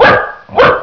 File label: DOG